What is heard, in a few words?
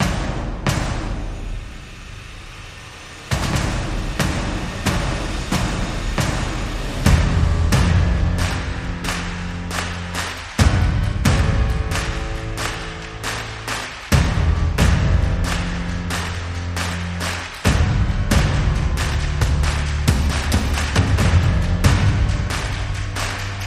Drums Bass and SFX Only